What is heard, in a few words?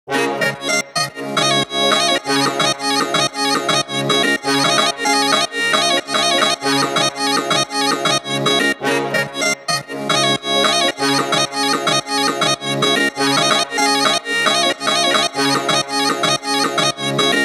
Assamese bihu drop loop BPM 110 C Maj; Gully Loops; UDD Gaye Sample Pack